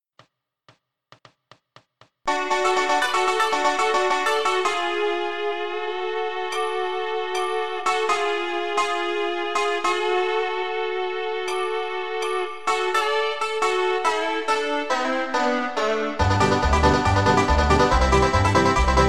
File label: Bluegrass, mp3 backing track